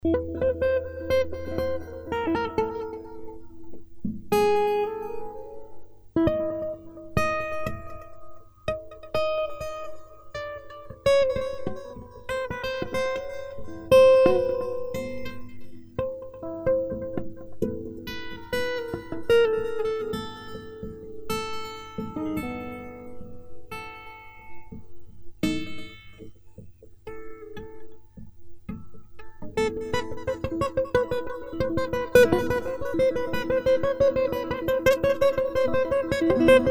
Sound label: Guitar; Lewoscreate; Split Second